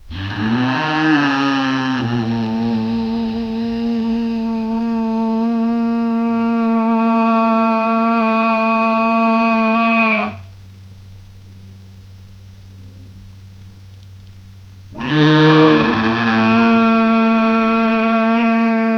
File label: a great honking moan; lament